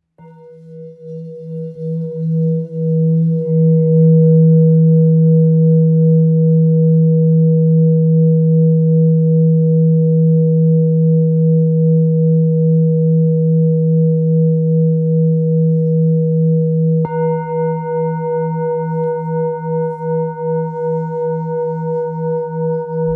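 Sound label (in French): Bol thick mi, bols chantants tibétains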